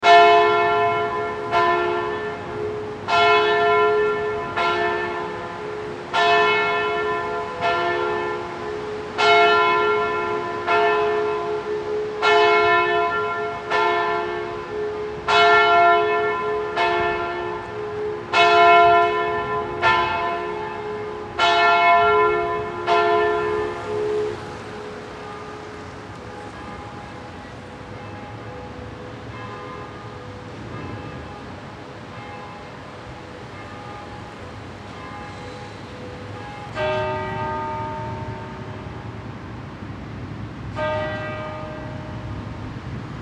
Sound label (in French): Cloches d Eglises